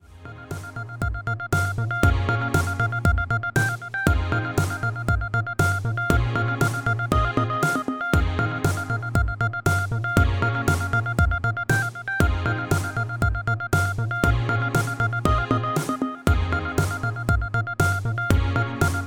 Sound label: menu music